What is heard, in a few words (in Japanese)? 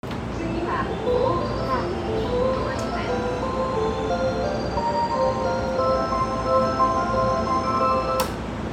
京浜東北線本郷台南, train sounds